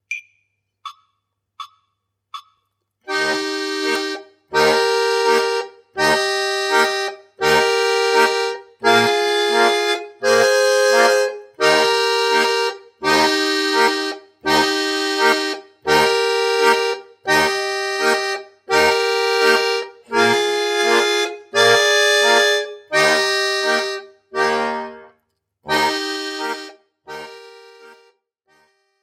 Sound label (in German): zweistimmiges Spiel